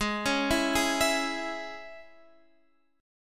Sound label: Db Minor Ab